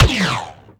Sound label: rebel blaster; bf2